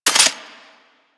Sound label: Heavy Shotgun Alt Mech; Weapons; Warframe; Tenno